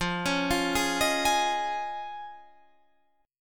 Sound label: F Minor 7th Sharp 5th, Guitar, Standard; JGuitar